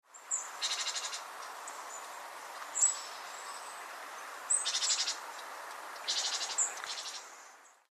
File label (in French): mesange charbonniere